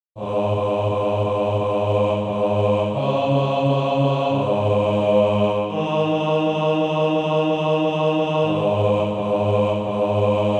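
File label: bass, SATB Practise Music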